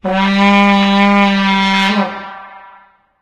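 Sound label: vuvuzela; source engine; tf; football manager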